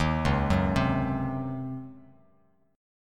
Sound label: C sharp Major 7th Suspended 2nd Suspended 4th Bass Standard; C#M7sus2sus4 arpeggiated; 11,4,4,5; JGuitar